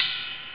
tsing, drums cymbals; OctaMED SoundStudio V1

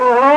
Moose; Yipe! III; Monsters

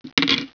roll

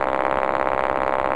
Buzz; M4 7.5.2; M4™ (PowerMac)

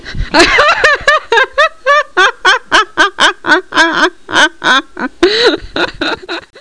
laugh fem; humor

